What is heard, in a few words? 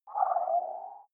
game start dull